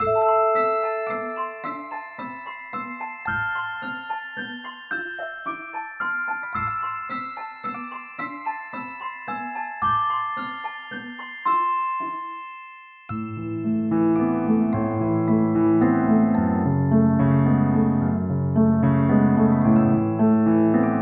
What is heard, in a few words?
honey melody